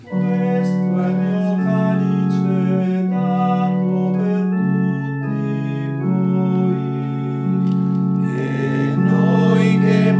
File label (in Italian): E Noi Che Mangiamo TENORI; Eucaristia; pasqua 2023